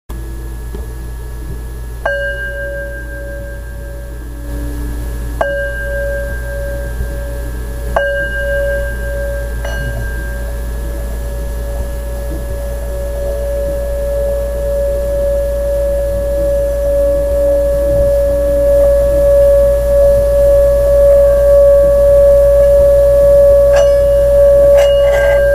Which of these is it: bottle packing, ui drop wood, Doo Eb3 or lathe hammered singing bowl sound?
lathe hammered singing bowl sound